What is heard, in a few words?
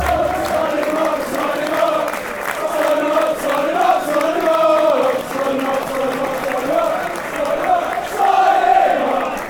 fanchants; sign him cufc